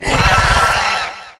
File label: activate; faustus